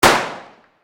warfare gunshot exterior; Machine Guns

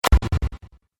Cinematic Hit, Trailer Sound Effects; sound design

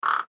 Bird Raven Throat, Bird Raven Throat Squawk; Animal

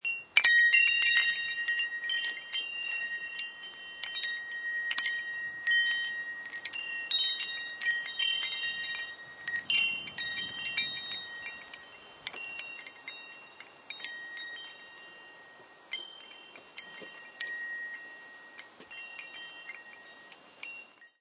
Windchimes outside; Part of Windchimes (Sound Effects:Musical)

